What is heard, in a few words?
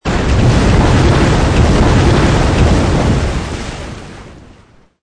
waterfall